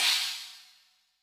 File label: China, Cymbal; Yamaha DTXpress-II